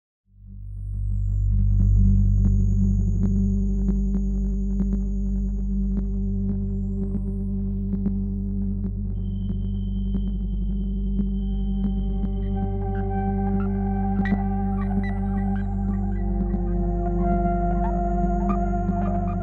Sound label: drone; archibald editions